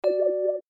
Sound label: Wobble, notifications; Samsung; Galaxy A20s